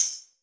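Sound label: percussion, Drum & Bass; Ueberschall; Drum & Bass Resonance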